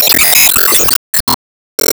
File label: Enemy Candledevil Strongattack Hit